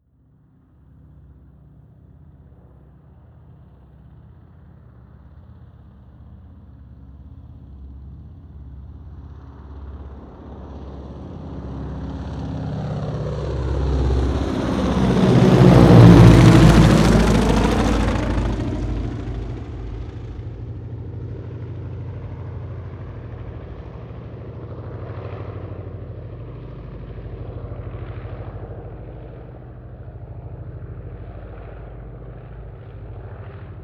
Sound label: propeller plane ju52 fly by overhead from behind